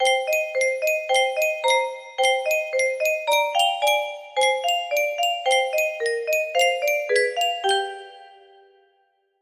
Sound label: lulabye